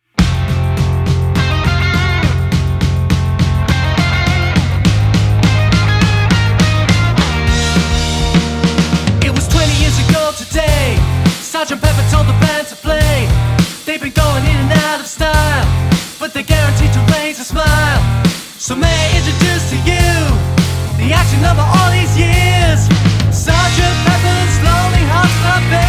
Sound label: Rock Pop Band; Oxfordshire